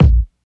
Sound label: dope bass drum single hit a sharp key, Royality free bass drum one shot